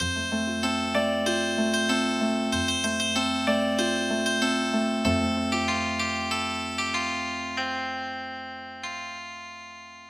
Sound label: Healing Harp, midi; Oyakodonburi